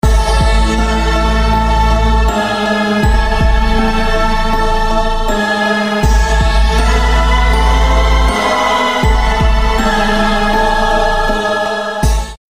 building and layering sounds

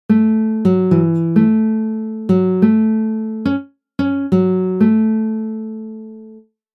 Guitar Melody; Then play it on guitar; Skill 1

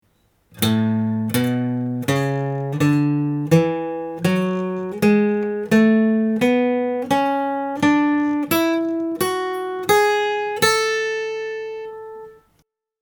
A major scale 2 octaves

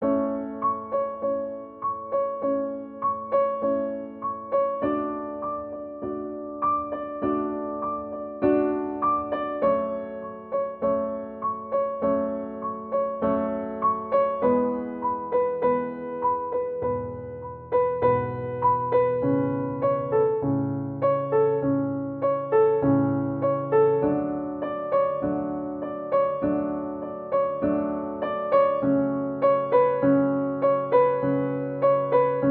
I IV vi V piano A

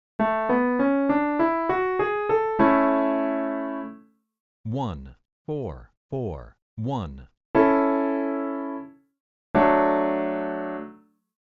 I IV Medium; Chord Progression Recognition; Training Exercise

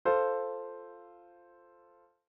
Minor Chord Progressions Example, F major

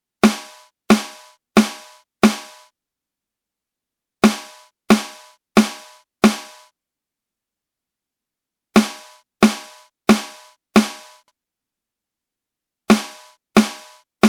Snare Drum 250Hz boost

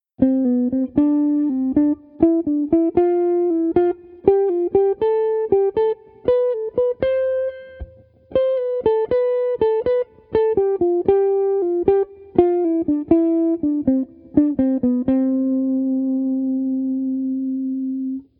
scale example

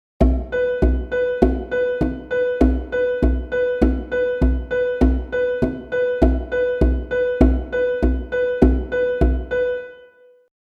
Offbeat

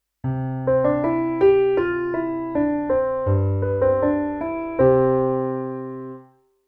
song with I V with d below

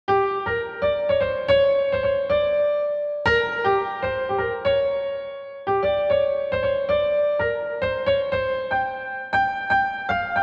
Improv Mel Random; Random Note Improv: G Blues

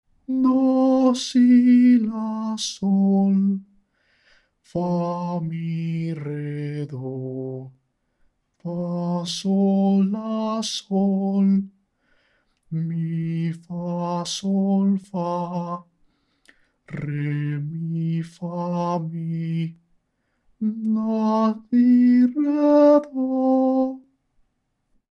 Sung; Exercise 4

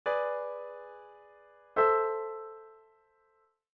Minor Chord Progressions G to A

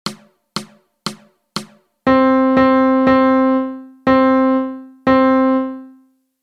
Melody; Lesson 1 Hard; Read Rhythms

